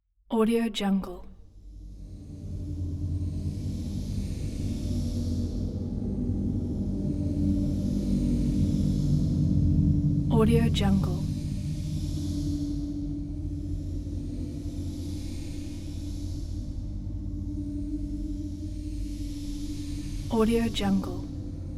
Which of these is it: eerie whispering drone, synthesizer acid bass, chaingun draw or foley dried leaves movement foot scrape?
eerie whispering drone